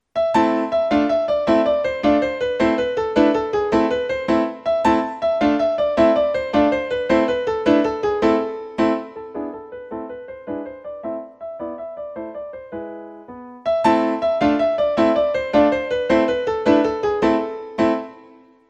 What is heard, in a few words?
Tarantella